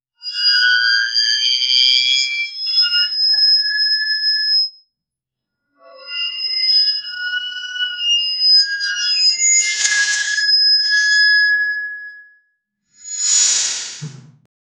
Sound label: robot made out of squeaky